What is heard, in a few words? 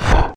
jump; player